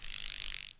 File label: buzz, forest; ambient; Half Life 2; Episode 2